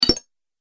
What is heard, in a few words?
Robotic Menu Click; Robot Sound Effects